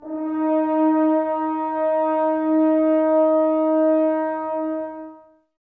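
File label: Brass French Horns, Woodwind Brass Ensemble; Sample CDs; Miroslav Vitous; F.Horns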